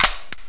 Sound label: rifle clip in